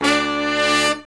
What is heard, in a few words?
BRS SHRT, R&R Horns, Sect; Roland L-CDX-03